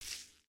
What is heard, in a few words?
Foot Grass Walk, footsteps